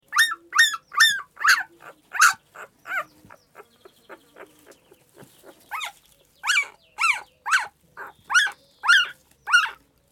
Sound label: Puppy sounds